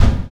Drag Kick; Sonic Foundry (Sony Creative Software); Drums & Drones; Construction Kits

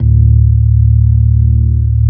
ORG B3, organs; Sound & Vision; Gigapack I CD 2

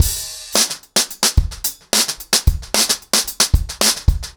110BPM, acoustic drums, drumloops; The Roots Drumloops; Zero To Ten

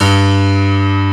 GRAND MF G1, PIANO

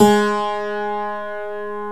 TACK PIANO

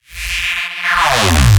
bass hit nose dive F; Virtual Riot

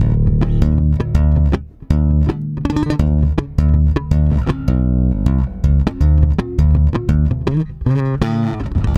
STRUM E, Bass; Spectrasonics; Bass Legends; 88 STRUMMIN

